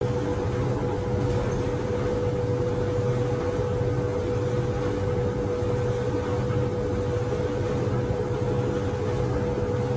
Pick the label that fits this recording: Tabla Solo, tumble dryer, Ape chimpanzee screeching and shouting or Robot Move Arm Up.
tumble dryer